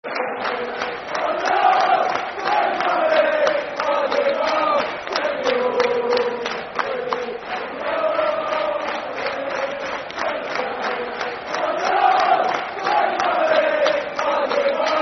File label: Football Chant (Soccer Songs), Fulham; Fulham Ffc